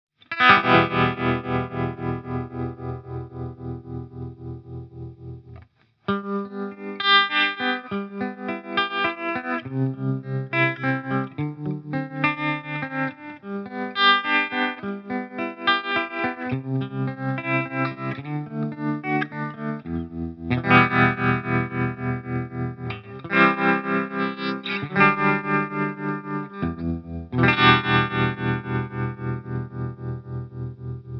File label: Tremolo; Harley Benton MiniStomp Hot Mod